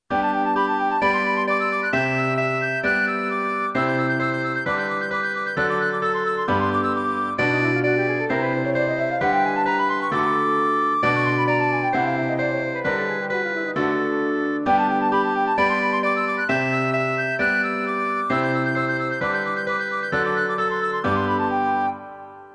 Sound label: Twinkle Twinkle Little Star